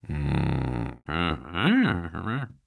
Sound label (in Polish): chrabaszcz; Szkola Koziolka; CyberMycha 2003 October